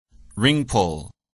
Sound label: ring pull